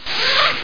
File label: zip; Sexy Games 3